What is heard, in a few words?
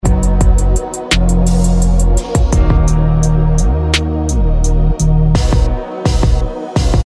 Inst